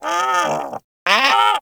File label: Sea Bird Vocal Idle; Characters; Warframe; Ostron; Hunting Critters